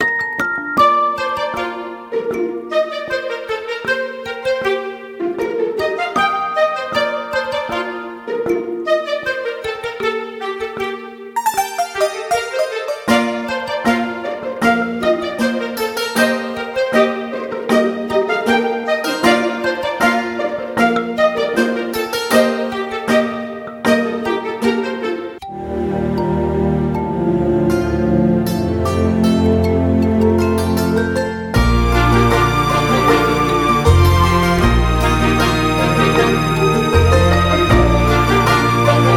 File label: background music